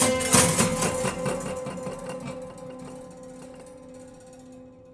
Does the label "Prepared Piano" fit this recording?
Yes